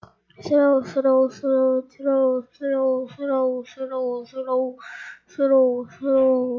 throw

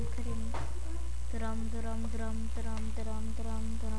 drum

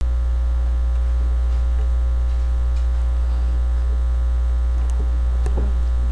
coin

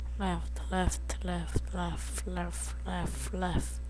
laugh

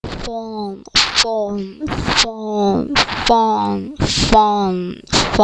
phone

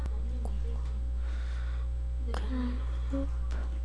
clap

